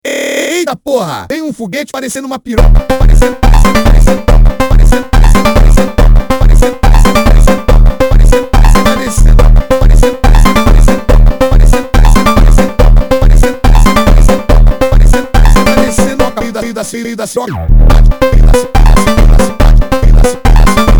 funk

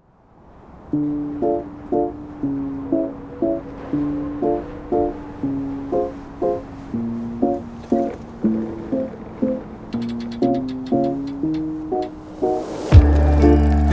bedroom lofi haunted carnival

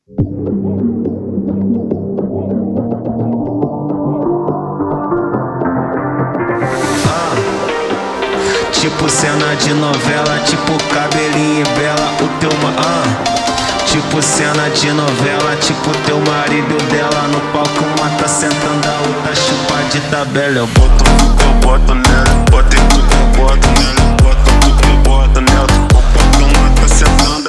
brazilian phonk; cena de novela

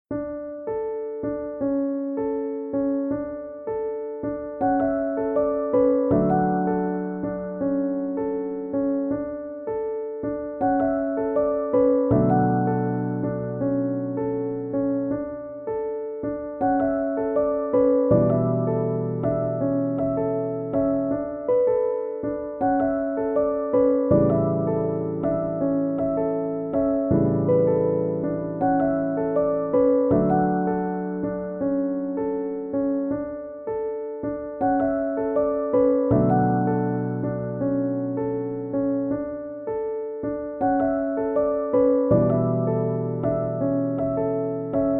lullaby version

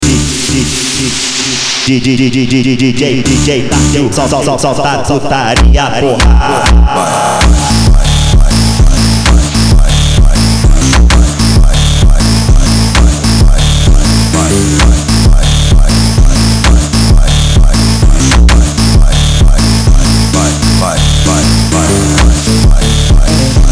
automotivo

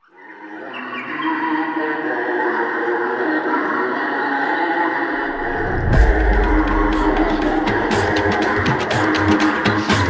slavic funk

